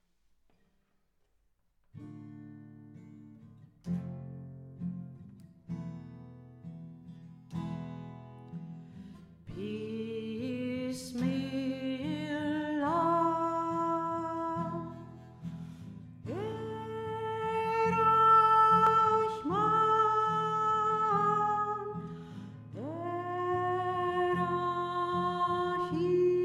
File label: bismillah solo